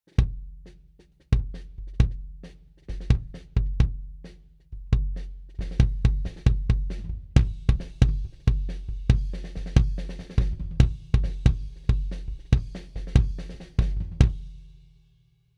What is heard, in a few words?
snare mics kick